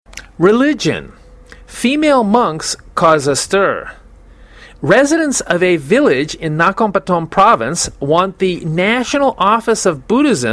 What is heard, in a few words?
monks female